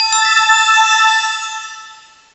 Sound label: maaagical